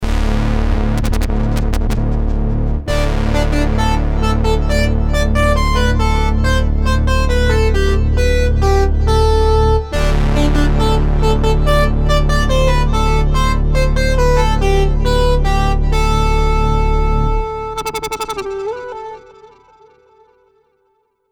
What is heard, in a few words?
Korg DSS-1; Cylone brass; main core based on 8 bits CPU Intel 8085 manages 8 voices polyphony